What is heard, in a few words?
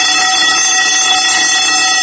alarm, ambient alarms; Left 4 Dead 2; Source Engine